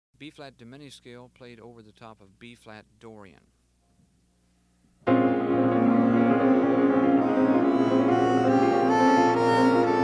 Bb Diminished Scale

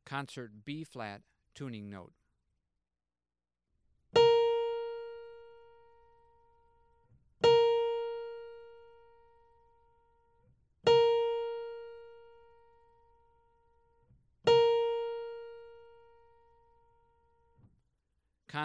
Tuning Notes; James „Jamey“ Aebersold Play-Alongs

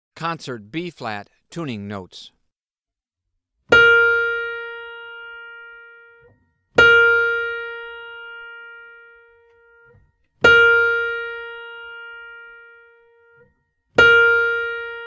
Tuning Notes; James „Jamey“ Aebersold Play-Alongs

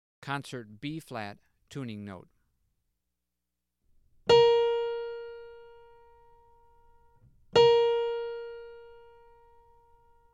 Tuning Notes; James „Jamey“ Aebersold Play-Alongs

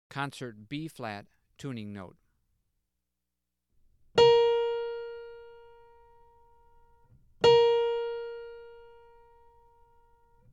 Tuning Notes; James „Jamey“ Aebersold Play-Alongs

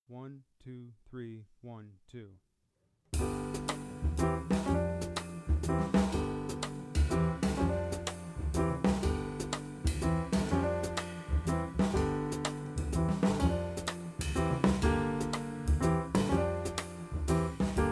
Total Blues Play-Along, Saxophone; Jazz Conception For Saxophone 21 Solo Etudes (Eb Saxo)

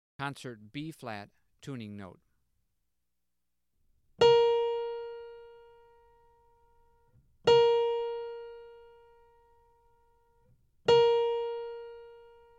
Tuning Notes; James „Jamey“ Aebersold Play-Alongs

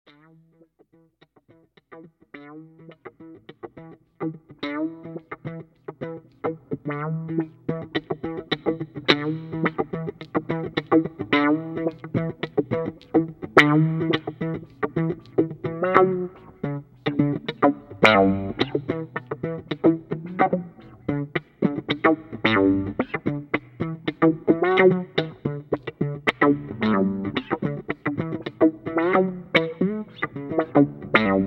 Wah Wah Prelude in E minor

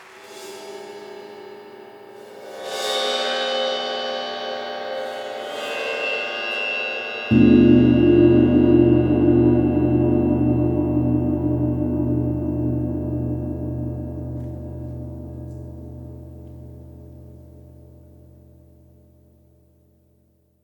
Gong; OPL UGDA; UGDA 150 ans

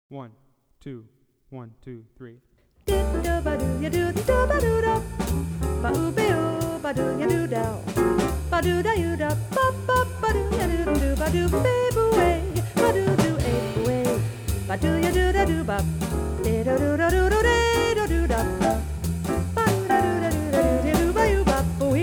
Saxophone; Jazz Conception For Saxophone; 21 Solo Etudes (Eb Saxo)

